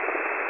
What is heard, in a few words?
connect